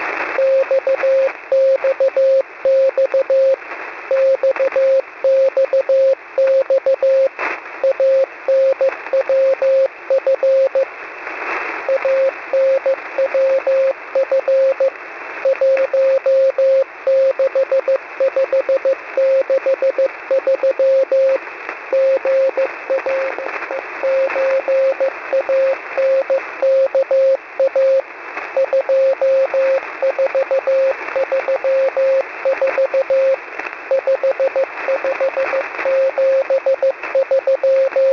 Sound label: buzzer; anvf 4887 cw